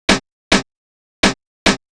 Percussion Loop, 105

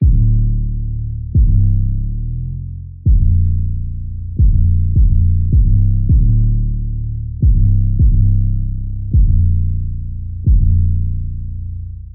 Bass 158BPM F Sad Sub

